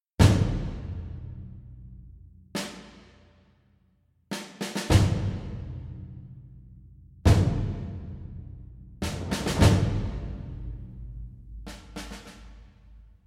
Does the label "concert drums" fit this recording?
Yes